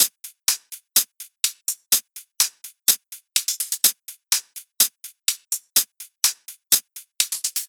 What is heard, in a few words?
House, 125, Closed Hat; Drums; Loops; Drum Construction Kits; Ghosthack; Drum Mega Bundle; Drum Hero 5